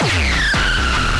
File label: Breakbeat 200bpm